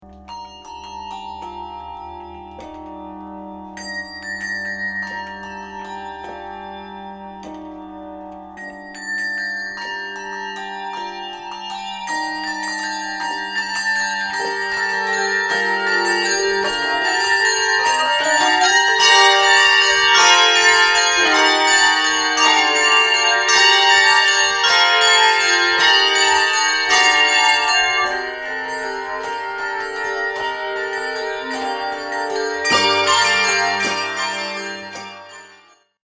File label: Ukrainian Bell Carol